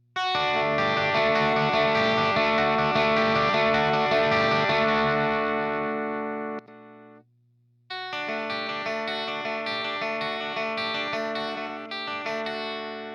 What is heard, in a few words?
EMG89 CLEAN, guitar; EMG89レビュー導入